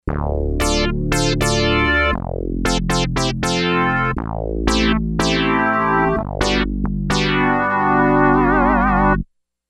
Sy. Brass

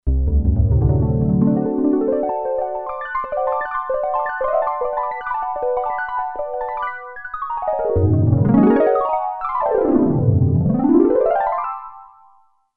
Harp, Roland Alpha Juno-2; Original Sound Chart patches

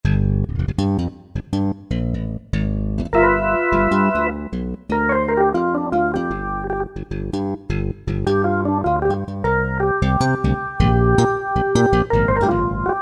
Slap Bass and Organ, Roland PN-D50-01

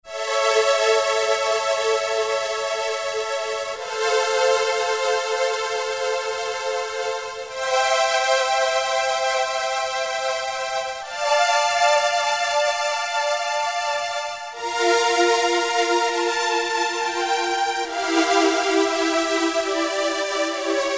Stringoo; Novation XioSynth 25

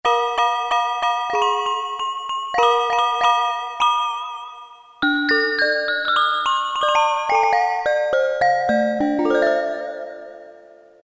Bell Singer, Roland MT-32

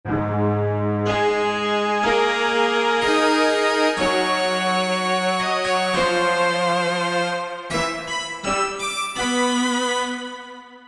Wire String, Korg MSC-1S; Korg MSC-1S Variety

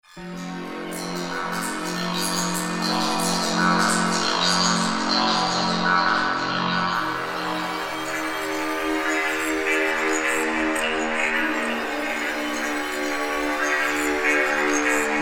SYNTH TEXTURES - Movements; Mykonos Movements; Apple GarageBand 2

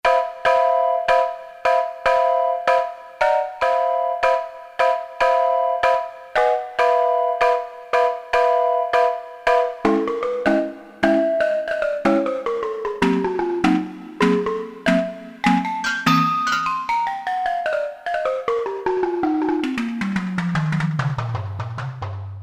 SinHits, Korg DSS-1

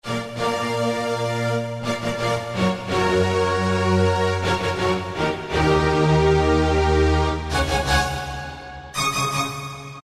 Symphony Strings, Roland PN-D50-01

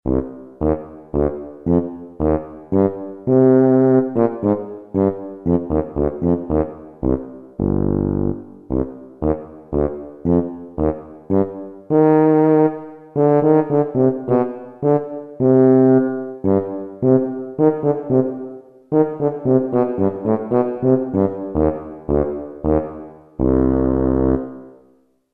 Tuba

